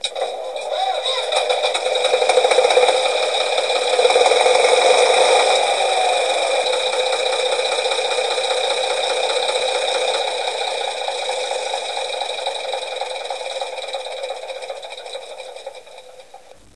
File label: Small diesel tug